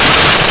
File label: scrape; Chase Hollywood Stunt Driver; XBOX